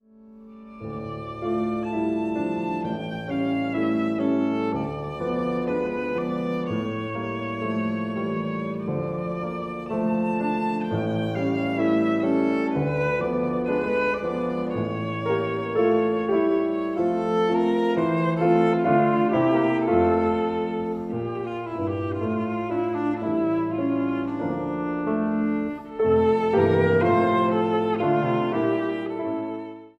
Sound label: VIOLIN